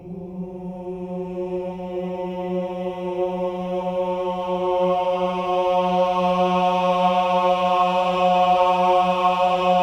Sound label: OH-AH F#3, choir; Spectrasonics; Symphony of Voices